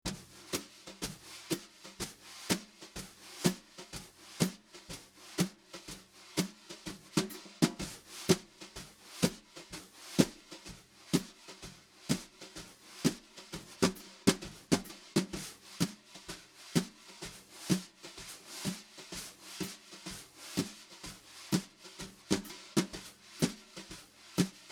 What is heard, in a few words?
Brushes SM57 only, snare drum; Yamaha EAD10